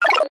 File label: negative game play sound fx arcade casino kids mobile app